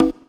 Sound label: Conga; Equipped Music; Loungin House